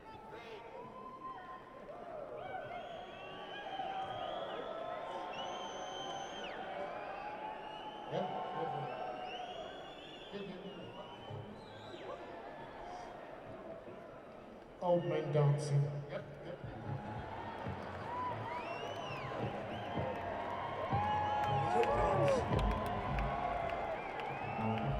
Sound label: Crowd Banter